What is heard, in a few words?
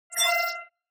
ui scifi bleep